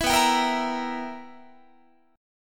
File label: B Major 7th Suspended 4th Sharp 5th Guitar, chord; strummed